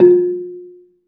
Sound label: BELA F3, BELAFON, Asian Traditions; USB Soundscan vol.60; AKAI